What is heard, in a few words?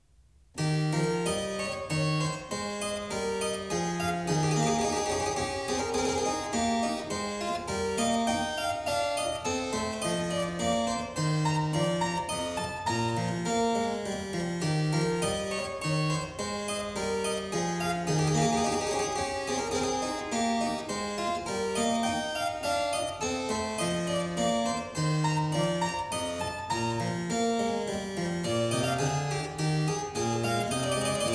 E flat major, Menuet; French Suite No.4; BWV 815; BACH; CLAVIER WORKS; FRENCH SUITES